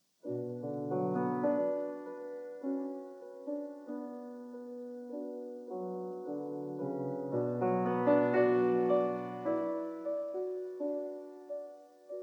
Prelude No. 6 in B minor; F. Chopin; 24 Preludes, Op 28

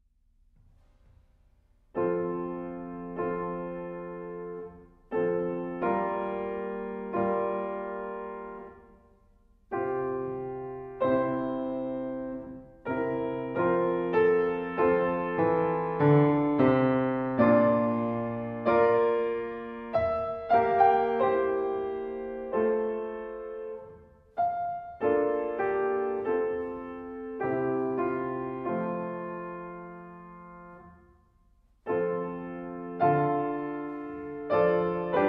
piano; Lascia ch'io Pianga (G. F. Handel)

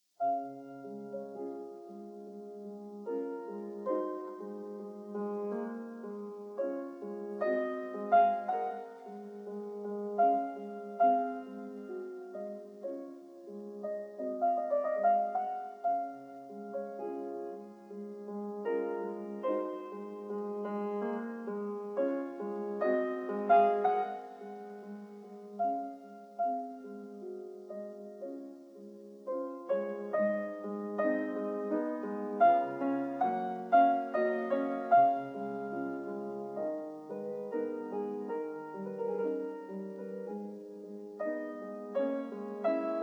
Prelude in D flat major; Prelude No. 15; Raindrop; F. Chopin; 24 Preludes, Op 28

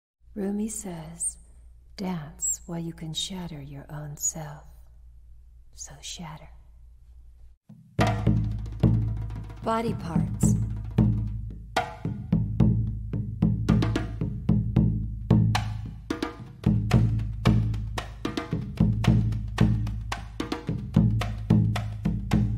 Percussion Through 5 Rhythems

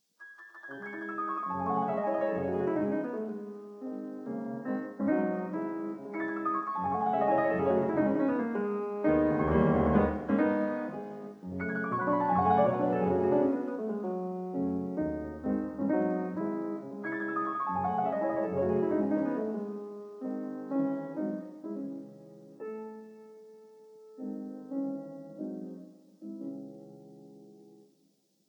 Prelude in C sharp minor; F. Chopin; 24 Preludes, Op 28

